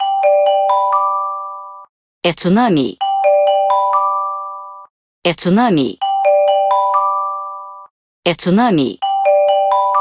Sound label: etws tsunami; CellBroadcastReceiver; android 10.0.0; pt